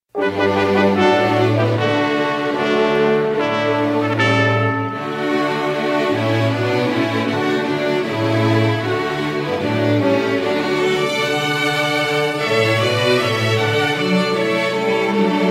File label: deck the hall instrumental